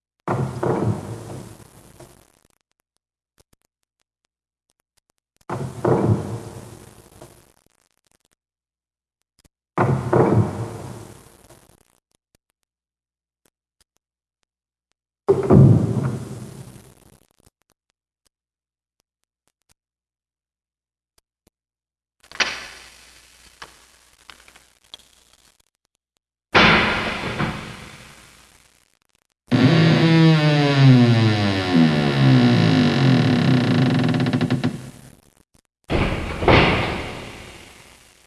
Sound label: Dungeon, sound effects